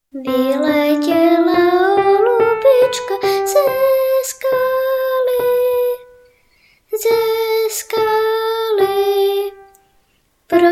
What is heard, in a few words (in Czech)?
Klavir Divci Zpev Divci Zpev